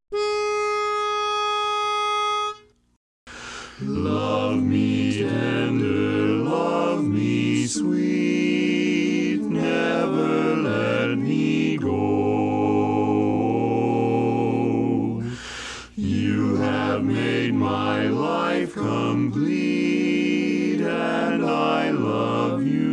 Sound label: Bass Part Predominant; Enrichment Track